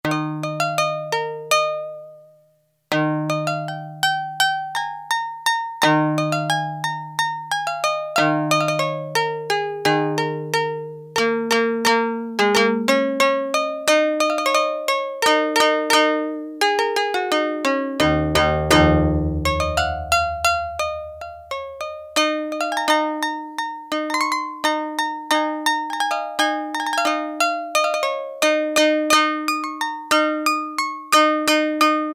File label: KOTO, Yamaha DX7